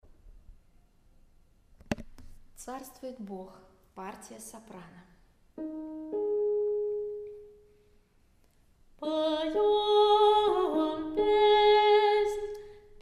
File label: soprano; Carstvuet Bog